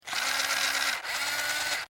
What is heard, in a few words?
Scifi Servo Mechanical Robot Small Motor Toy